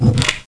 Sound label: Pickups; UnrealShare; PathPoint